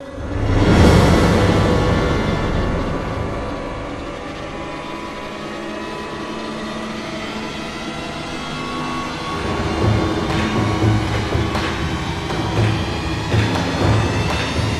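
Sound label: sting reverse hit string swell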